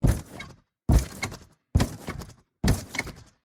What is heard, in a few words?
gymnastics trampoline hits